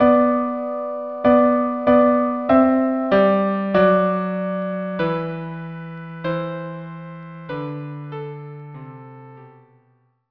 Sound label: violin cello duo; lo how a rose